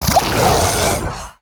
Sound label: Growing Ooze Death